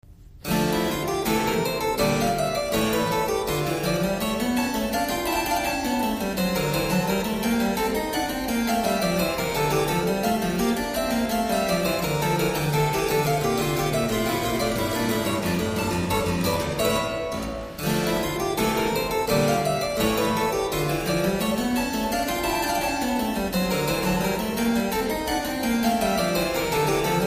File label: Partita Air in E minor; Partita No 6; BWV830; Johann Sebastian Bach